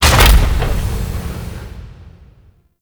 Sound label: Room Lock; Orbital Station; Caliban; Warframe; Tau